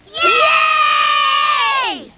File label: yeaah